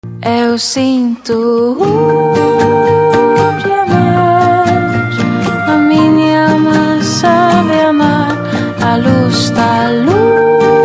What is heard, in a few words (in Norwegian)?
ringetone; Ving